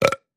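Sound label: Short Nice Burp; burping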